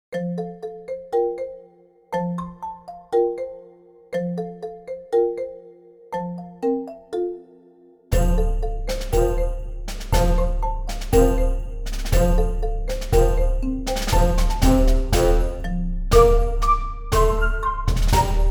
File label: Childish Play, KIDS 귀여운